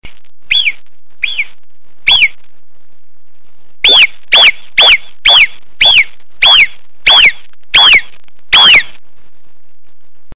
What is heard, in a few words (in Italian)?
verso marmotta